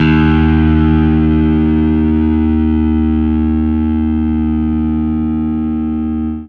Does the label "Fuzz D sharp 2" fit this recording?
Yes